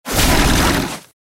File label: Tiger Block